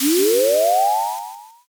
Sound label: firework launch